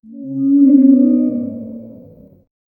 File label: healing by a mystical for